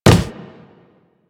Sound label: Walk Wood, Footsteps; Warframe; Operator